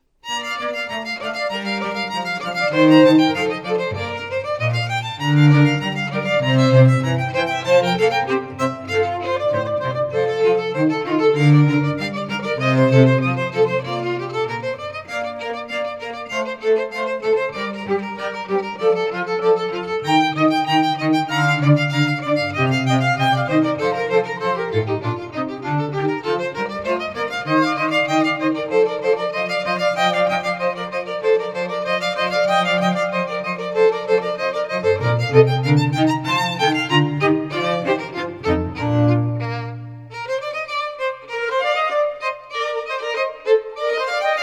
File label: strings, London String Trio; classical musicians